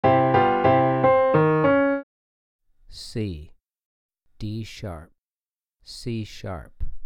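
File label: 3 Note Melodic Piano Major c3 d#2 c#3; ear training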